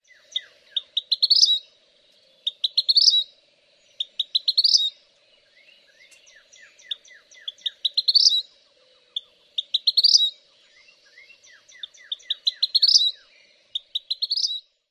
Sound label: dawn song